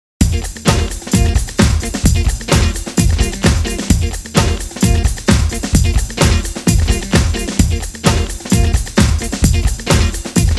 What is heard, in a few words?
Jingle; Dance Dance Revolution; DDR Disney's Rave